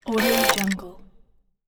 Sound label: robot mechanism